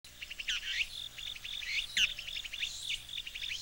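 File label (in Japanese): モズ, 野鳥の鳴き声; 筑紫小学校校庭及びその極周辺で観察した鳥たち; 筑紫小野鳥観察ｸﾗﾌﾞ支援; chikushishou bird watching club 2018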